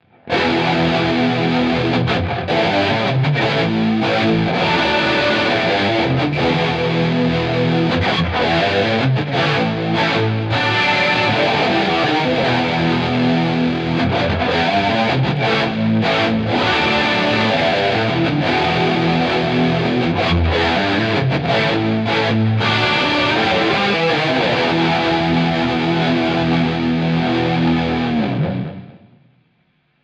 DI Aufnahme Micropitch; hinter S Gear

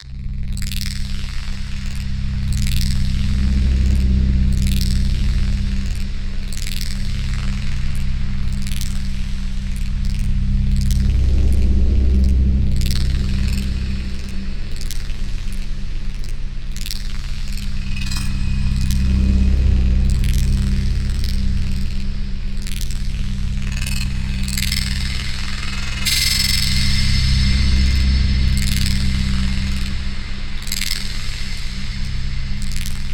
Granular und Regular Soundscape Motion; SonuScore Nordic Spheres

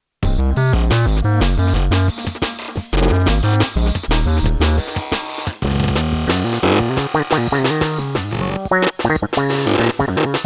LoFi 12XT MixTape recording Beispiel

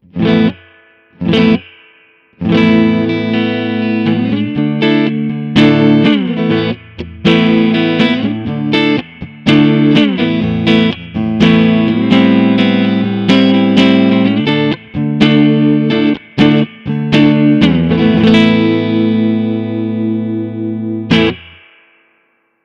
Crazy Tube Circuits White Whale Effektgeraet Rev Short Mix 2 Uhr Dwell Tone 1 Uhr

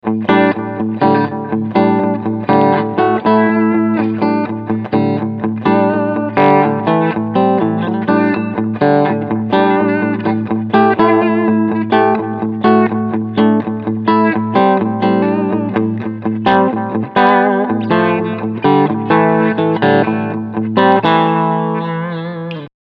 Steg HB Clean

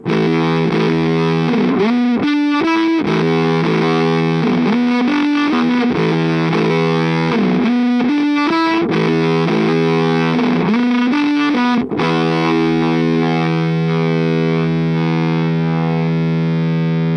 Octave Clang high Gain Tone zu; Death by Audio

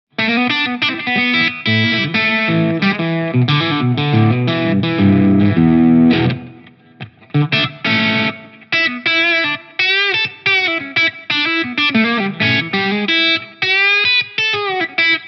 Barncaster Steg tapped crunch; Tarot

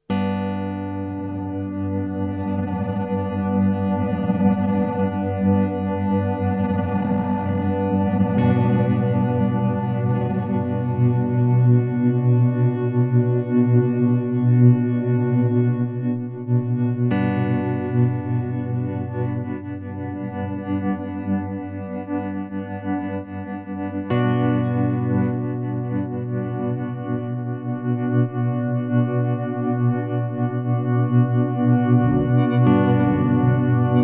Chase Bliss Onward Freeze alle drei Error Typen nacheinander

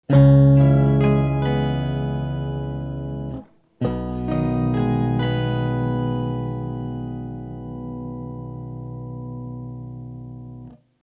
Mooer Harmony X2 Oktaven individuelle Outputs

